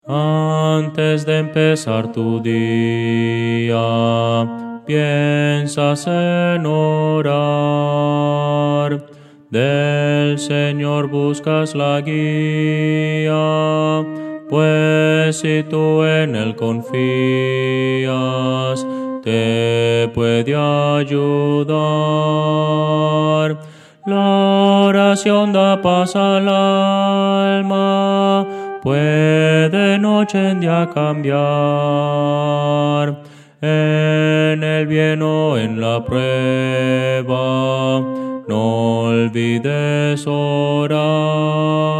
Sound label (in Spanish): contralto; Voces para coro